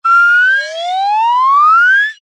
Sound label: cartoon slide whistle ascend climb med slow